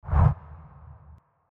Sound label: wuf